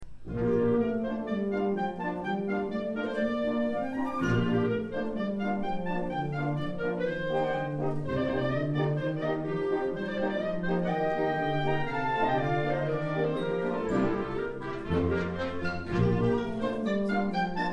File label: a little concert suite scherzo